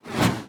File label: bet Line Smoke